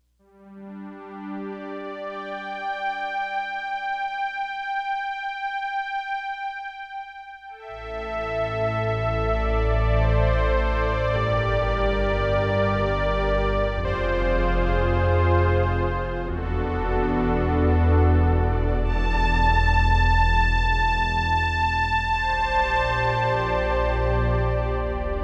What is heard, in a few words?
jx8p strings; dss1 demos